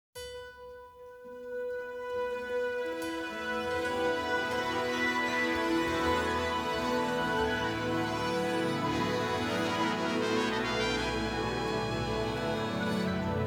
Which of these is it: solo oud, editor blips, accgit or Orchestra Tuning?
Orchestra Tuning